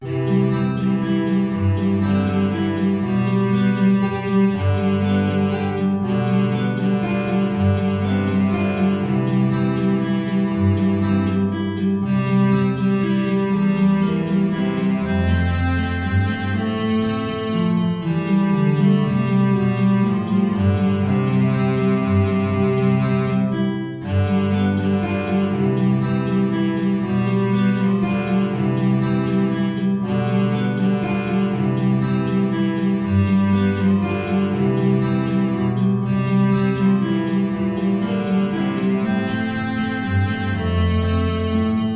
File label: sweetly the bells are ringing